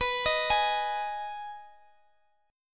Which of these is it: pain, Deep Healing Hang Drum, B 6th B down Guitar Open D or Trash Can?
B 6th B down Guitar Open D